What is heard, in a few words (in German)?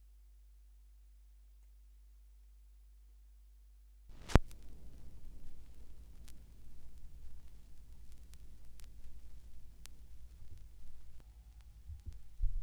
schallplattengeraeusche, Regular Vinyl; Vinylknistern